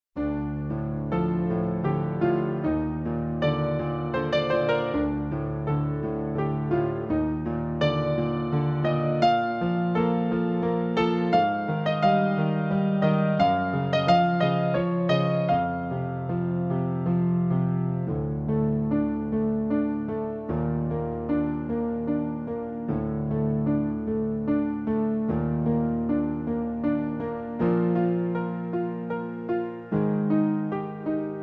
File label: Horn Elegie